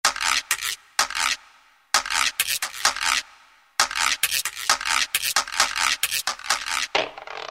GUIRO, Roland SN-U110-02 Latin And F.X. Percussions